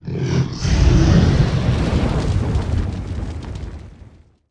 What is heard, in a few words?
Dragon Roars Breaths